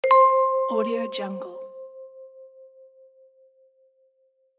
message notify

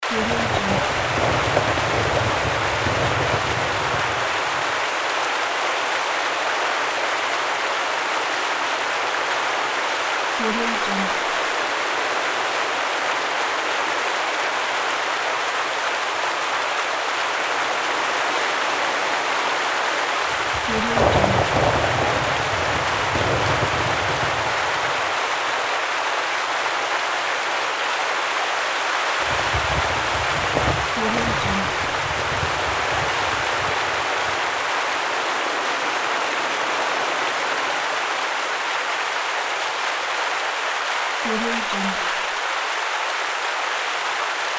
intense rain and thunder storm loop

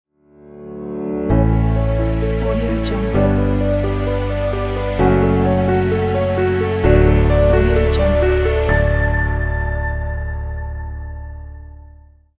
piano ident

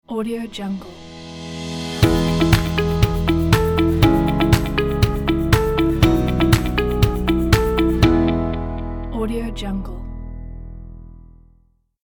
corporate logo

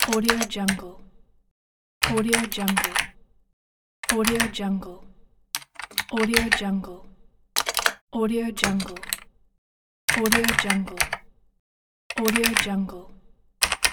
computer keyboard

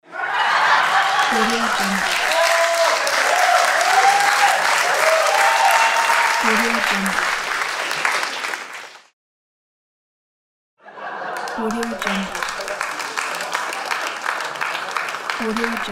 audience applause and laughter

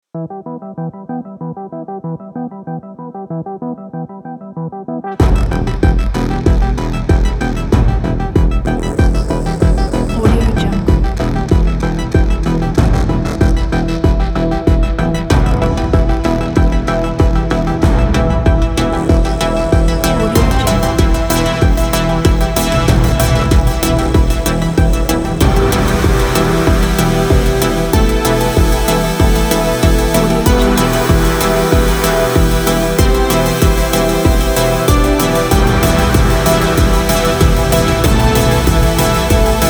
cyberpunk mystical epic

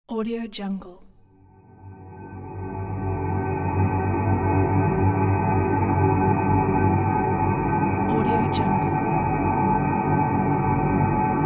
galaxy drone ambience

